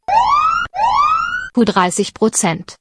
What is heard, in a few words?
Alarm; jeti sender; alarm schwaches signal